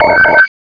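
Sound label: turret spot, sentries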